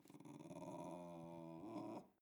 dog snarl aggressiv

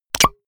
pop

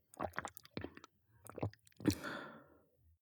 drink glass milk swallow small

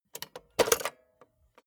cassette player slide tray take out soft

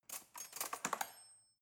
cutlery knife drawer handle ring

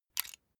puncher release

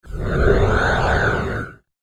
airy smooth phase

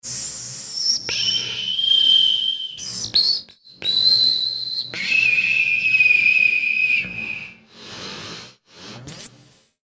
pschh

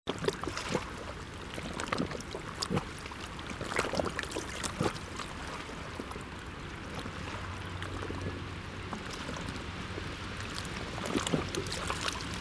lake bank

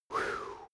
cartoon male relief close call

